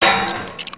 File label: Metal Object; destructibles